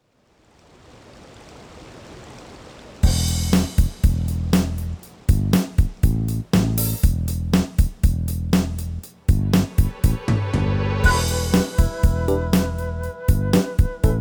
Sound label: midi